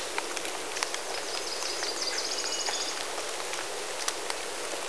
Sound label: forest